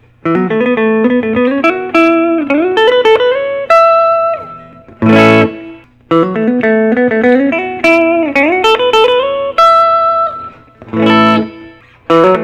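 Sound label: gibson historic 60 sg reissue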